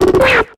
Grito de Trapinch

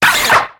Grito de Axew

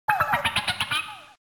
Grito de Finneon

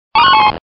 Grito de Sneasel

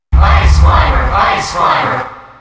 Grito del público; Ice Climbers; SSBM

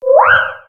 Grito de Minccino

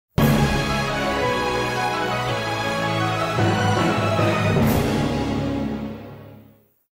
Tema de victoria; Corrin; SSBU

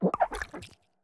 Grito de Smoliv

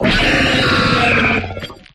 Grito de Ferrotesta

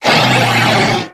Grito de Lycanroc forma crepuscular

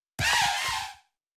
Grito de Cufant

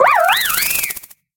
Grito de Leavanny

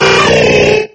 Grito de Hitmonchan

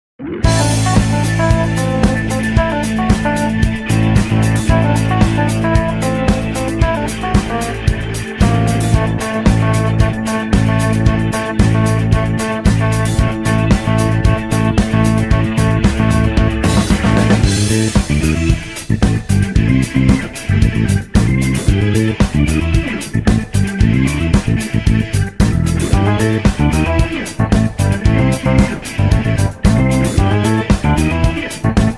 Tema de Victoria; Joker; PERSONA 5; SSBU

